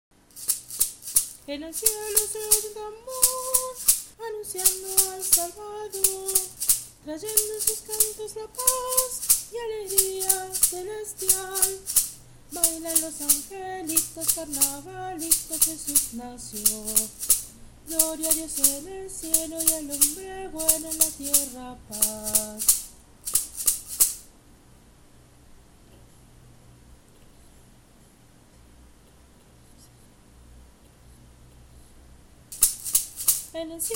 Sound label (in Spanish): Maraca y voz. Carnavalito